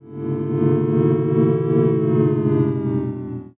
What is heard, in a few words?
chatter